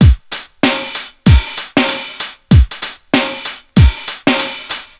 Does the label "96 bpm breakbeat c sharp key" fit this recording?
Yes